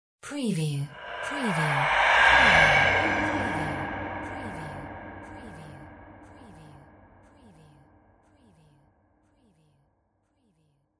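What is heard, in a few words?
sci fi whispers spooky reverse; windy beach audio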